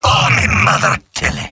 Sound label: demoman negative vocalization; tf2; garrysmod; mvm